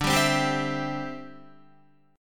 D 7th Suspended 2nd Suspended 4th, D, down, Guitar; strummed; 4E,4A,5D,5G,5C,6F; chord